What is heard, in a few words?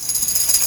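drum roll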